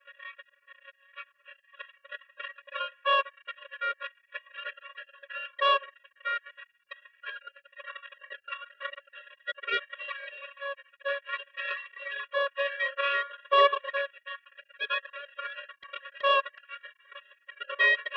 Jazz

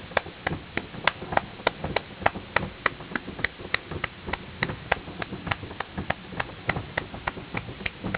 percussion

